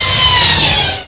speeder; lucasarts; yodademo

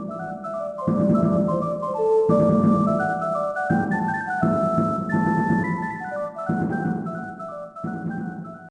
city jig; DAoC; Toa

